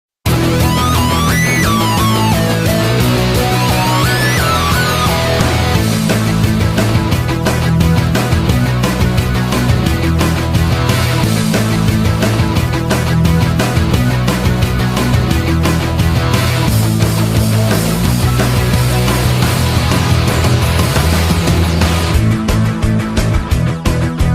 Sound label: instrumental; total drama island